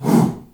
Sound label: HOO, SHOUT; Spectrasonics; Heart of Africa 1; CH. SHOUT1